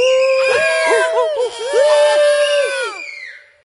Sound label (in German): Ratte Jubelnde; Jamba